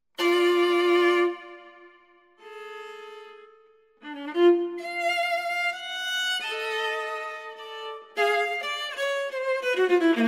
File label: Bagatelle Preludio (Viola)